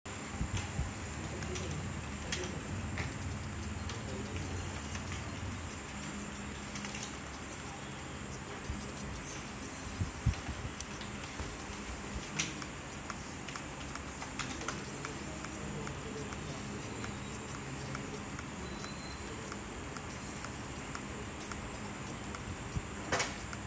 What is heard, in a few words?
karaoke recording